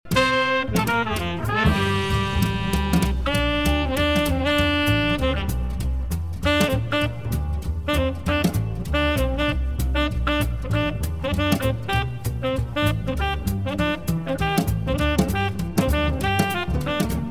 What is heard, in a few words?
solo